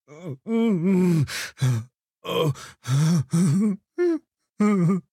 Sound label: moan